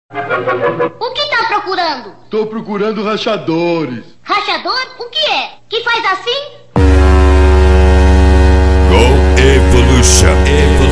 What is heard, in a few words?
funk tumdumdum funk bass